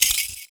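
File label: PERC, percussions; Univers Sons - Raricussions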